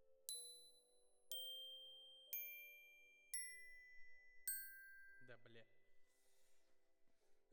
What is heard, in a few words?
Glock Close